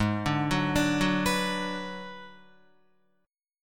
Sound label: Ab Minor 7th Flat 5th Ab, Guitar; arpeggiated; 4E,4A,5D,5G,5C,6F; 4,5,4,7,6,6; JGuitar